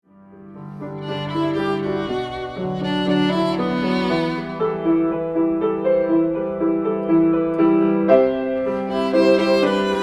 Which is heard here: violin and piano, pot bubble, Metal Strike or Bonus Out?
violin and piano